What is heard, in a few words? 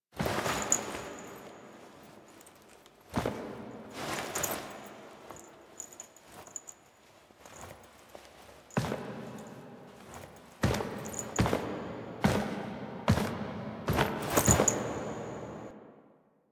Foley B; Warframe; Quests; The New War; Sealab; Hunhows Lair; Drifter